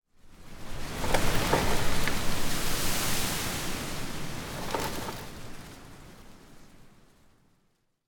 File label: ambience foliage; smite